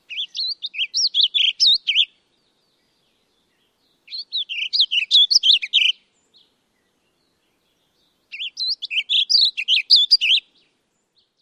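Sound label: warbling vireo song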